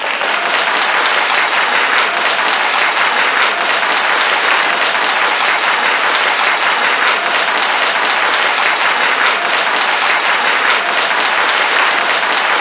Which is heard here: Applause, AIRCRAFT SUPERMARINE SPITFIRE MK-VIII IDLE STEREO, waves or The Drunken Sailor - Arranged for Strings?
Applause